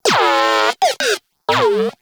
Moa Compassionate Vocal Disagree; Warframe

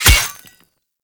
Melee Axe Impact Robotic Flesh; Axes

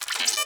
Cipher Use, UI

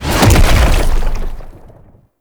Defense Heretic Demolyst Land; Warframe; Nineteeen Ninety Nine

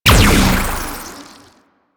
Plasmor Shot, Shotgun; Warframe; Weapons; Corpus; Arca Plasmor

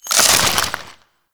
Crystal Growths Attach; Citrine

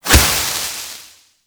Hazard Sparks Start; Gameplay; Stadium; Warframe; Nineteen Ninety Nine

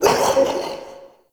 Mockery Male Vocal Pain, Male; Enemy

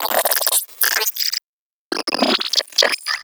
Computer Beeps; Guild; Warframe; Misc Remaster

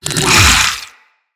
Infested Big Ashen Melee Swing E, enemies

